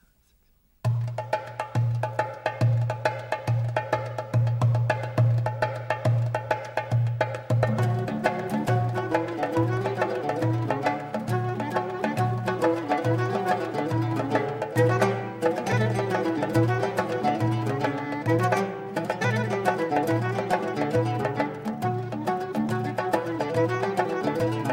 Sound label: Pub 14